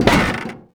pull metal

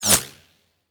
bullet flyby rifle close; Rust

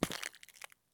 run asphalt; Escape from Tarkov

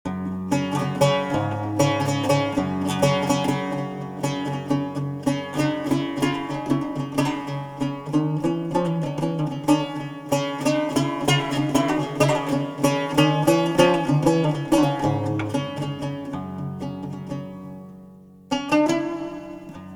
Arab Marshes, Middle East Africa War Music; Civ5

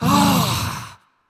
vce get up

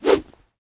2 handed sword attack; Rust

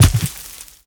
connect dirt

